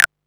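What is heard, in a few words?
MISC Right, Techno 138 BPM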